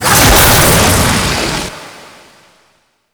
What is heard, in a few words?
Spit Attack Launch; Warframe; The New War; Archon; Nira; Abilities